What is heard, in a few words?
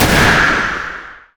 reckless swing; olaf pentakill; LoL